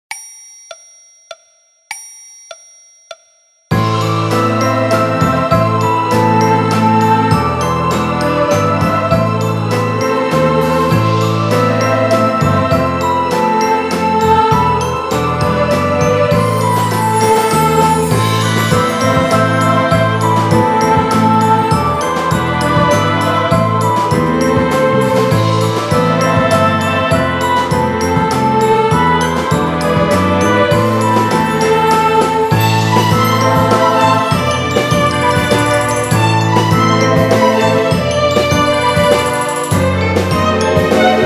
Orchestra Version

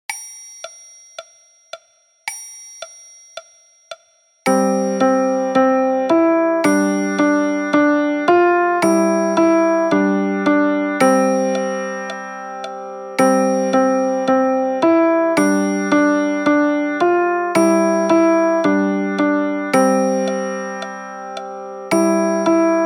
Piano Version

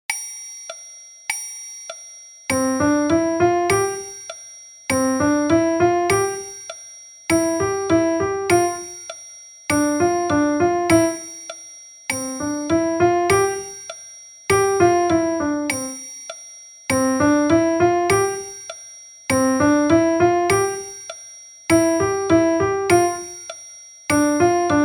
Piano Version

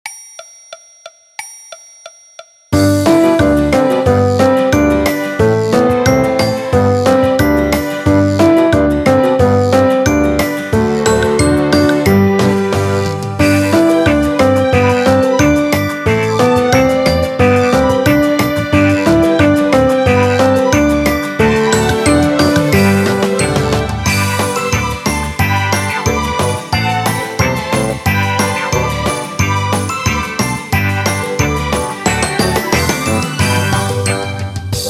Piano Orch

